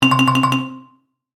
Multimedia Alarm, Technology, Multimedia, Alarm, Element; Alert Sound Effects; SoundEffects-BlastWave